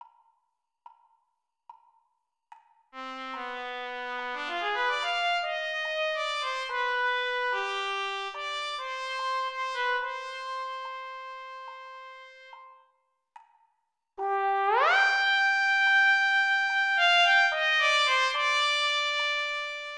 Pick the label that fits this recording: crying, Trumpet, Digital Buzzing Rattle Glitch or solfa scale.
Trumpet